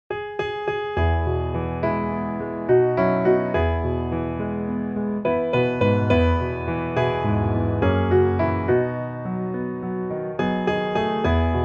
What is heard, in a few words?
piano